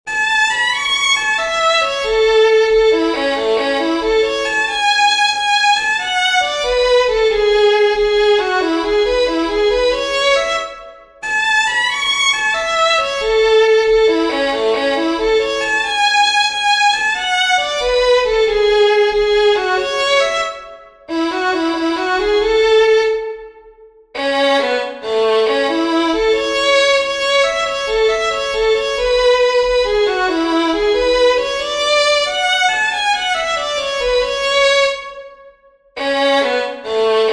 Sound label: JIG, fiddlers; CHETTICAMP